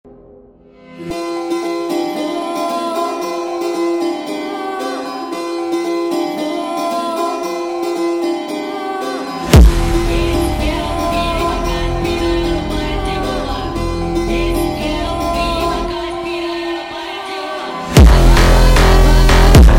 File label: Funk sped Up